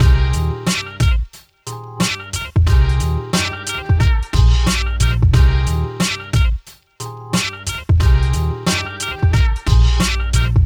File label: Drum Loops Comb Mix Invert; Mixing Secrets